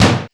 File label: SDS2000 BD, Simmons SDS2000; SDS2000 Factory2